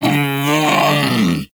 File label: Vocal Death; Hunting Critters; Predasite